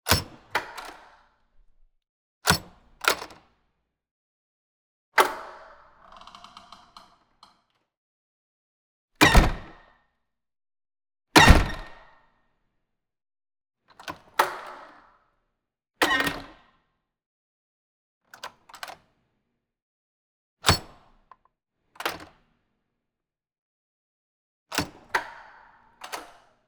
door industrial open close large; The Sound Keeper; Normal Doors

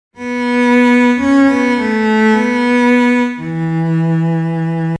sad theme; papabear theme

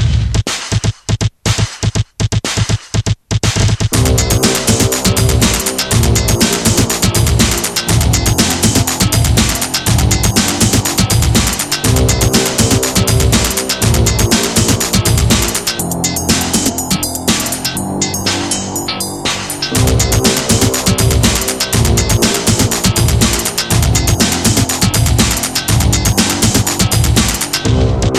Electronica Samples

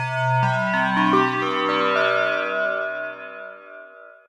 Ring Modulation with 2500Hz

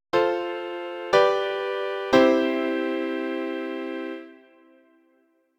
IV V I without Neapolitan

